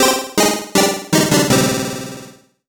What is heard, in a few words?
Solo Chip C64 Negative; MUSIC EFFECT; startide